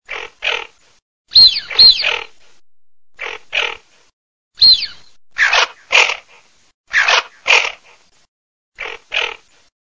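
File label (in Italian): moretta fisc maschio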